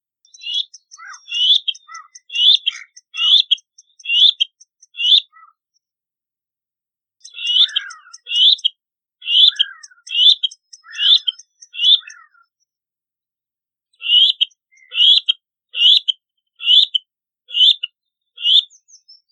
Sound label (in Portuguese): Canto do mergulhao de pescoco preto